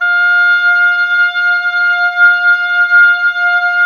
OBOES F, Oboes, Wood Winds; Miroslav Vitous; Woodwind Brass Ensemble

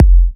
Kicks F; markus hakala

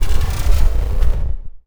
FX Hit; Drums & Drones

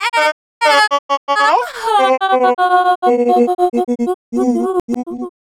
VOICE FX, Dance Vocals

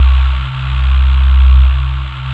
VOX C2

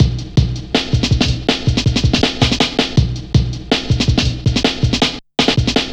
Drum Loops, Jungle

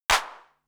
RM1X Drum Anlg Kit Gand Clap Ana; Yamaha RM1X

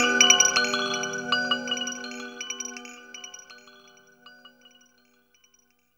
TRANCE BELL; POP-DNC 2

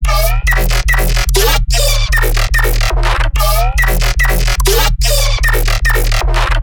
Weirdo Loop, Bass Loops, 145, E; Virtual Riot

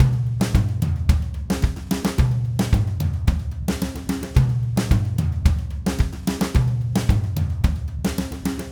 Red Maple Groove 110, DW Red Maple Kit

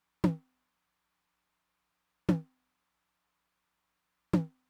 Mid Tom, Roland TR-909; drum machine; Roland TR-909 Ableton Project

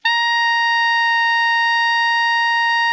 ALTO MF A#4, Saxophones; Greytsounds; Philip Wolfe David Crozier's Saxophones

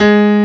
PIANO; sound canvas

